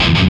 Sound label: GTR; Roland - LA Composer vol. 1